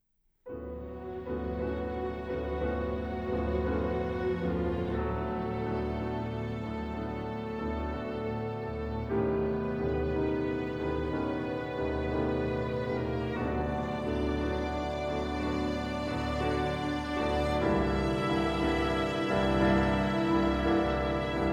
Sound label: Piano Quintet